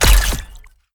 sword

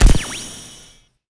spark light

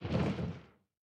flap wing

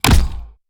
throw sabre

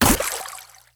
water cannon

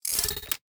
military xbow reload

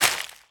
paper

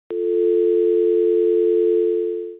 phone stop

